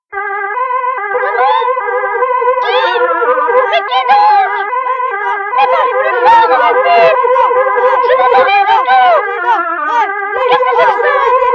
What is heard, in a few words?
Jingle